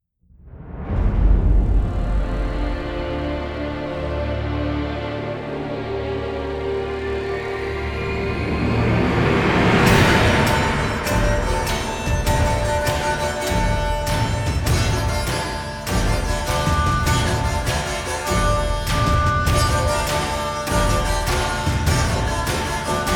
Storm

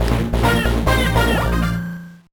Win; Orbulon

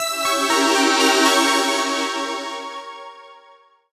Jingle Game Over; Puzzle & Dragons Gold

